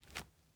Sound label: masonry jump; teardown ps5 windows xbox series xs gamerip 2022